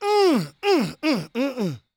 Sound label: shouts, gospel preacher; Spectrasonics; Vocal Planet Vol.1 Gospel; akai